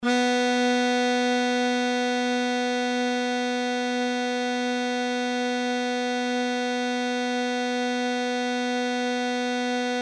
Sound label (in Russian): 3 struna, struni gitari